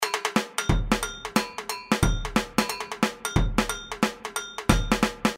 Batidao Breakdown, samba

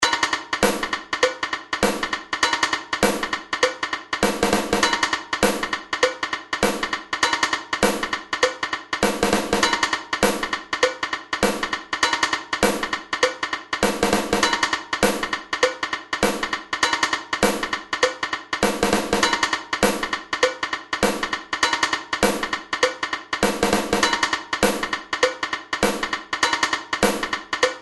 Batidao Breakdown Caixa, samba

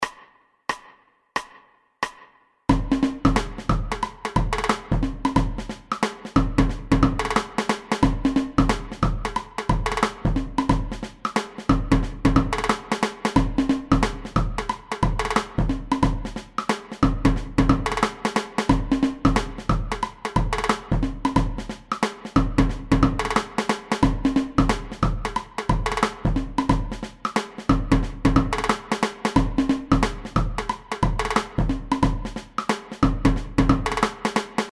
Batidao Full, samba

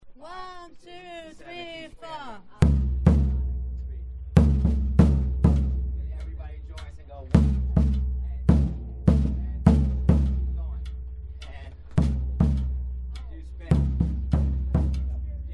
Maracatu Alt Surdo; Carnaval; Carnaval2105

